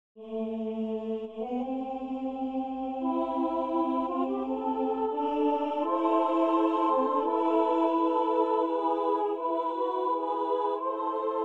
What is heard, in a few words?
full instrumental; hear me ye mortal birds